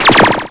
laser effect; Sound Effects for Multimedia - Volume 1; electronic sounds

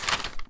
paper

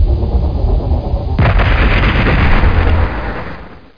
ERUPT

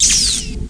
Magic; Majesty; OnlineGame

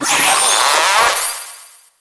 octopus attack; DAoC

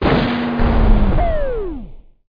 tilt

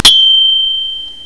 Chime Button; oslo

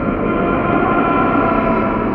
warp loop; PC Gamer IT CD 41; Daikatana

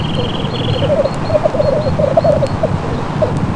tetras lyre, animals; Sound Effects for Multimedia - Volume 1

